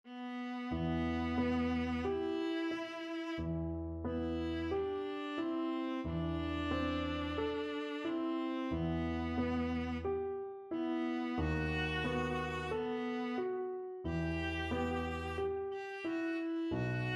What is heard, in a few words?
il dolce suono, viola